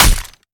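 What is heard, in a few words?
melee character gun large steel; viper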